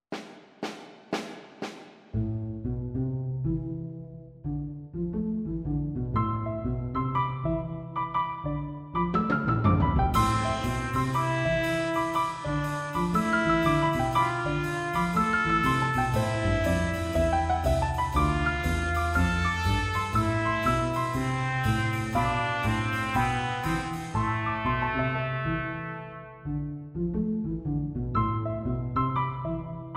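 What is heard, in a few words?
Off beat Trumpet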